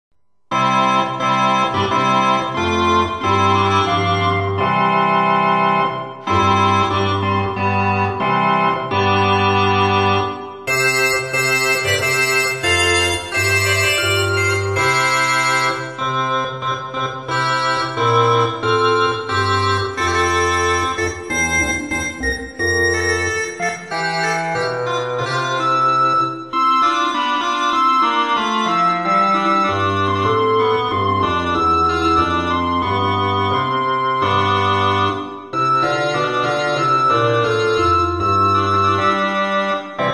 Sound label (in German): Schnarrpfeife